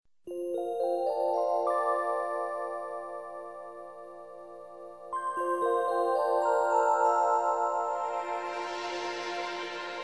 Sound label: atmosphere whale song, music